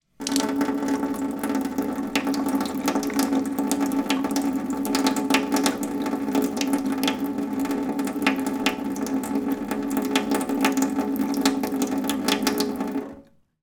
household water light trickle from tap into metal sink